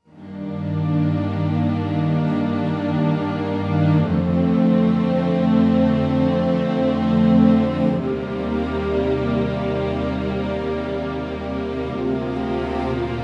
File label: Slow Minor Theme Strings Version